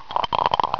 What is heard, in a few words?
rattle